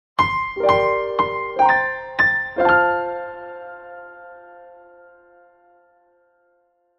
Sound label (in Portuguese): emocional